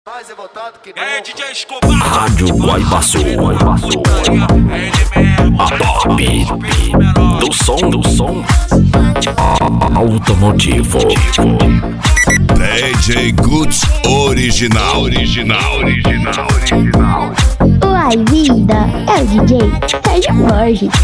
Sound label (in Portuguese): mega funk; RADIO GUAIBASUL